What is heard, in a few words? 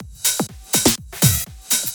house drum loop maximum sidechain